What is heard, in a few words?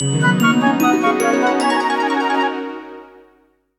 alarm